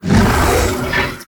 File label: Monster; Zenless Zone Zero